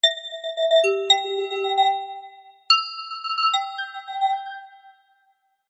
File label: Sms; Beta